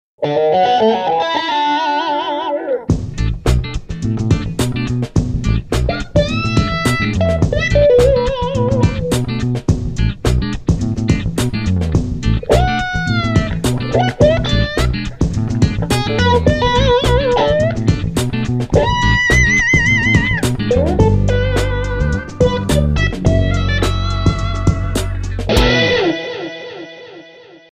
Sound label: Blues